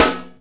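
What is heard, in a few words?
snare drum funk; E-Lab; X Static Goldmine 2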